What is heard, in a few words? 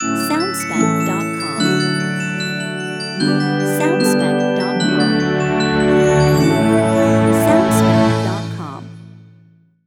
Christmas is Coming Signature